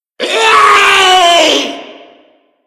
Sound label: smoker warn, voice; source engine; left 4 dead 2